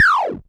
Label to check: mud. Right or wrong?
Wrong